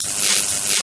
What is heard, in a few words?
bolt shock